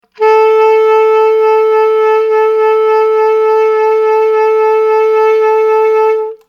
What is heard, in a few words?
Vibrato 440 Hz 3 pro sec